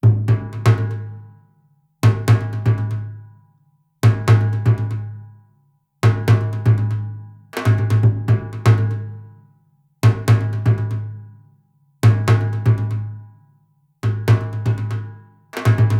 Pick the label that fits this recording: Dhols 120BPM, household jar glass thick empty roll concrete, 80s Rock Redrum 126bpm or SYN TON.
Dhols 120BPM